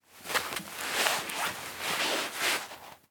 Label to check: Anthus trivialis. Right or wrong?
Wrong